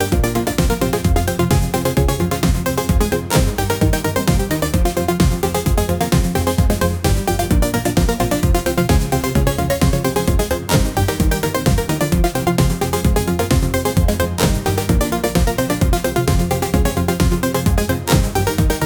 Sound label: dance pop; music